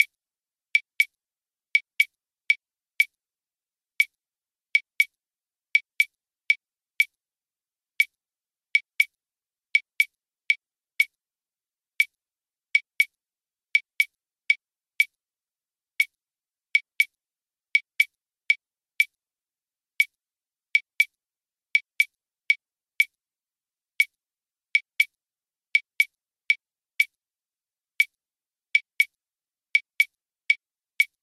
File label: yambu matanzas clave